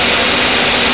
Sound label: rushing, ambient